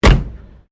Car Holden FJ 1955 front door close, classic Australian cars; Vehicles